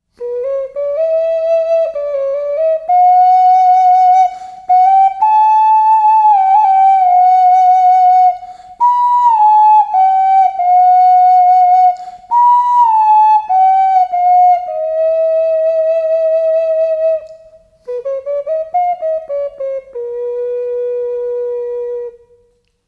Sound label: Ocarina